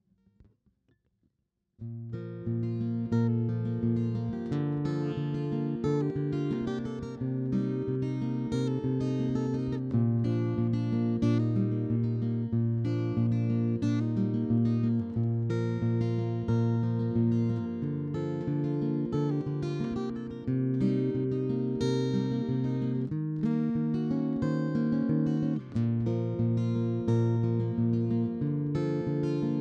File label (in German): Katana Akustikgitarre